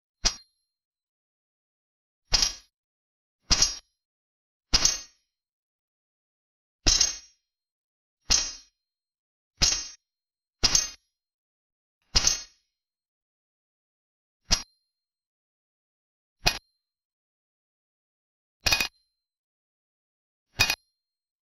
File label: design a short impactful, stealth